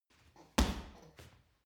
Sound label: punching bag kick hard